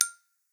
Triangle

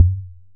impact Soft heavy

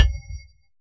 impact Mining

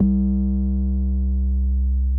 Drum BPM110; Martian Chess

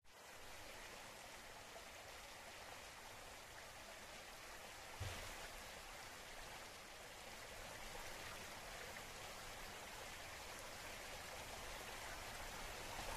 Creek; Cape Ferrello Creek; Ambient

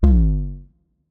3D Invalid Move; Martian Chess Audio

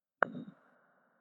3D Put Down; Martian Chess Audio

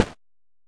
footstep grass

